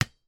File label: toy plastic inflatable ball hit with hand